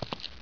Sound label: run; TNPDEMO